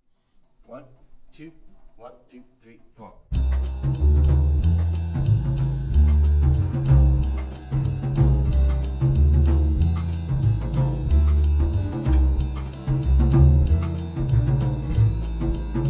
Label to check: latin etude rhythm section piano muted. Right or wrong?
Right